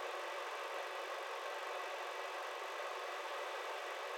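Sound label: office projector fan loop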